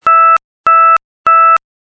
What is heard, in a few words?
User Select Low Seven Plus; dexcom g4 platinum